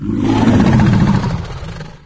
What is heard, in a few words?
chatter